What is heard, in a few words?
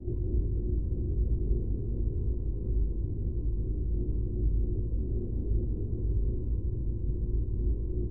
Engine idle